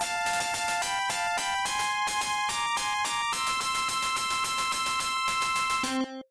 Key Item Recieved; Genesis; game soundtrack; Magical Hat no Buttobi Turbo! Daibouken

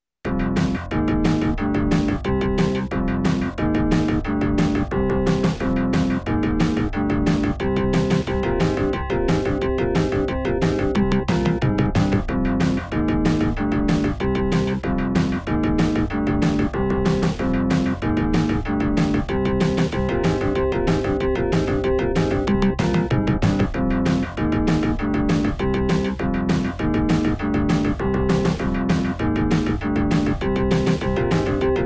Ice Boss Tune; Genesis; Mick And Mack

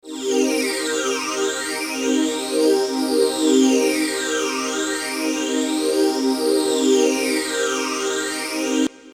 Warning, Chiptune; Playstation; Ape Escape

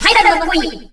ROCK

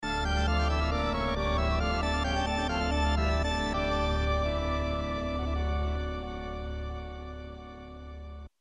Game over; Castlevaina - Dracula X

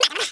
ROCK

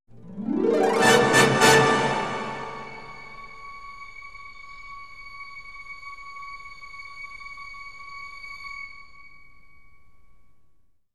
Brass Stabs; Production Music; Ren & Stimpy

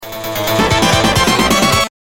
Stage Clear; Genesis; Shadow Dancer

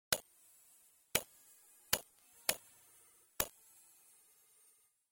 Growl; Runark; Genesis

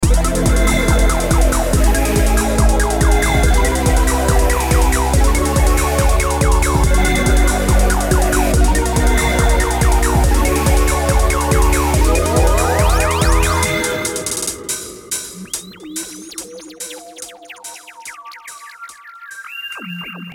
vehicle; N64; Bomberman Hero [Remastered]

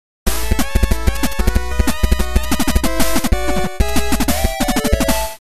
Battle Victory; Mega Man X2 (NES)

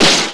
ROCK

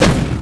ROCK

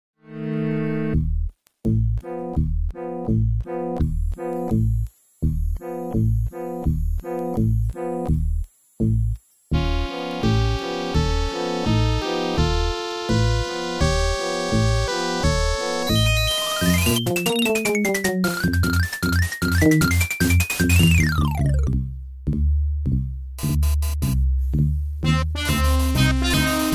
Intro and Theme; Quack Shot; Genesis

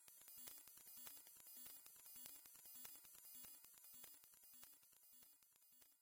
Bonus Slots (Fast); Genesis; game soundtrack; Magical Hat no Buttobi Turbo! Daibouken

